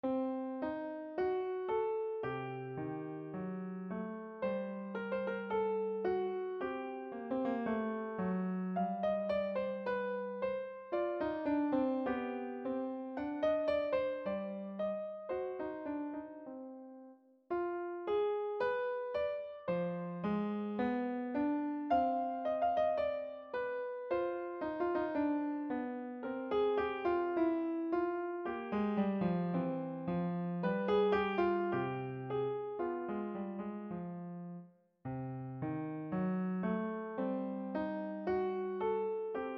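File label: Ukrainian Canon, piano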